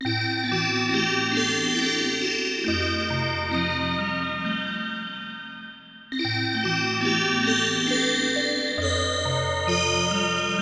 Horror House Organ; Shin Megami Tensei Devil Summoner Soul Hackers; 3DS; Horror House Organ -Alt 4

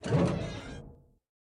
Open Mine Door; Lethal Company

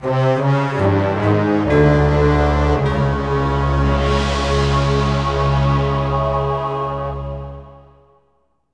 Bosscombat Lose; The Hobbit; PS2

